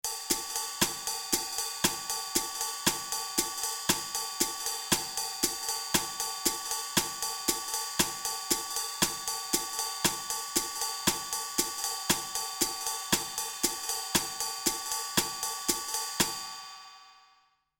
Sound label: Jazz